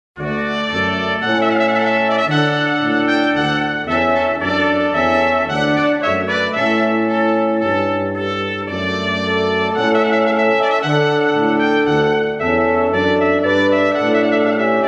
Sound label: Trumpet Voluntary